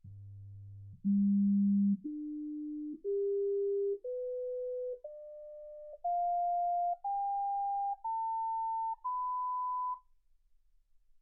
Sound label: sinus tones 48kHz